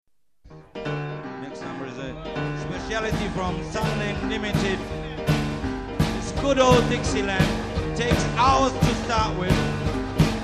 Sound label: Shuffle